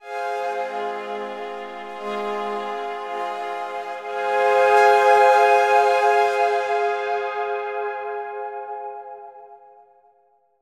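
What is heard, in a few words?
Synth F minor; torchlight iii; ps4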